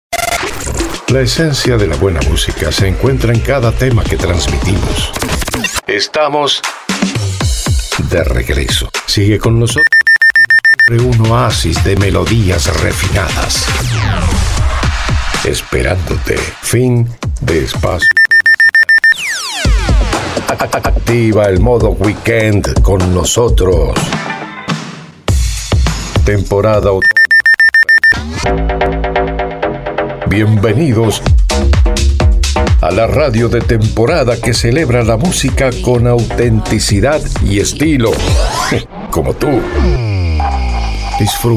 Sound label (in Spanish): Arte Ambient Otono